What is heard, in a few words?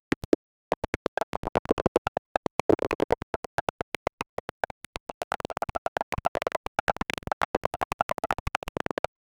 smooth rhythmic, quiz